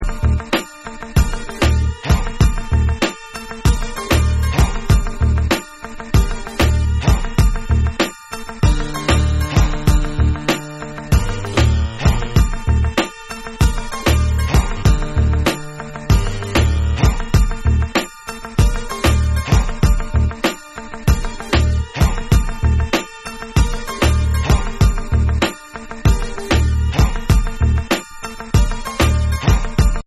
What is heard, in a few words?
Instrumental